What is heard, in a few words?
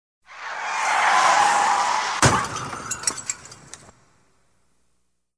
crash; SOUND EFFECTS1